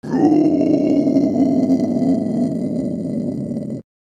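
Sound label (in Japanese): 叫び声（ウオー）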